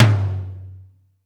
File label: MD POWER, TOM; East West - Global Sounds; D4 BD SD TOM